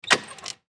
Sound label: Go Kart Brake Push Down; Go Cart; Vehicles; Go-Carts; SoundEffects-Blastwave; Sports